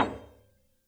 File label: piano